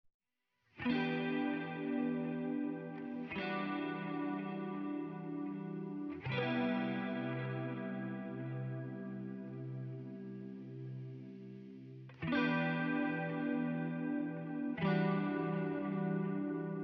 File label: Spacey Guitar Chords